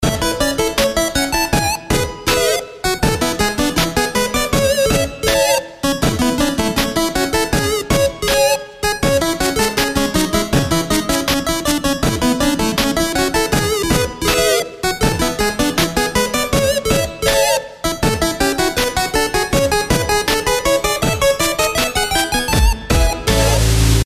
Polka

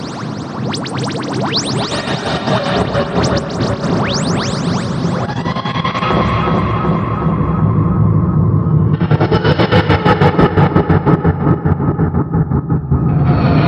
crashins; tardis

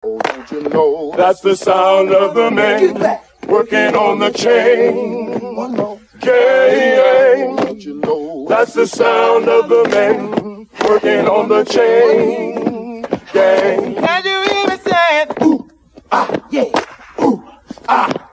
Chain Gang Song

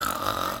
Snort

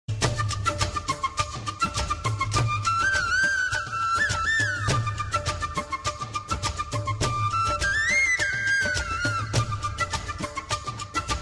Flute

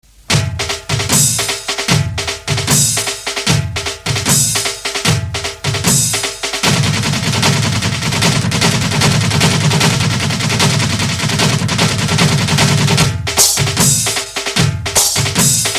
Beats Of Drums

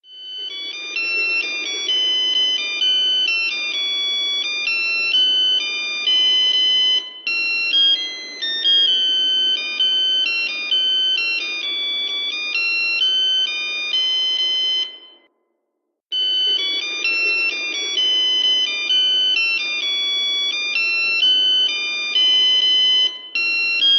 Best Morning Alarm

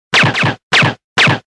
Silencer

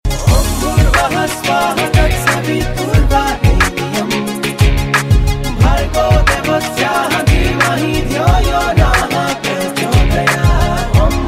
Om Bhur Bhuva Shava

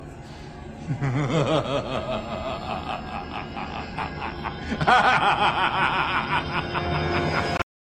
Laughs; Agent Smith

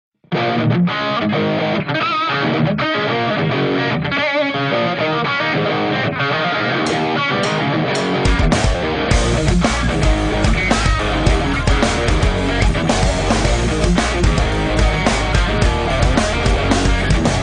Gibson Sg Supreme

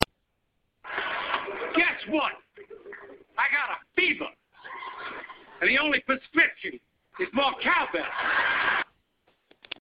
More Cowbell

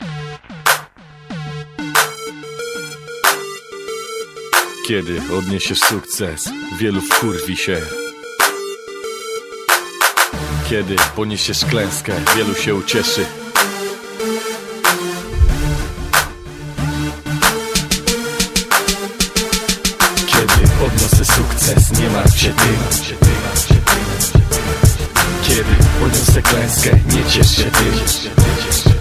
Rock Band

